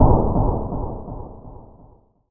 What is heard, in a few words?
Jump scare echo